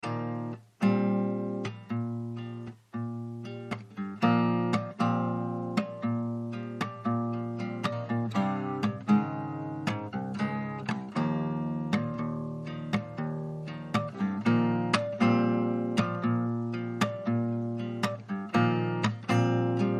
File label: Groove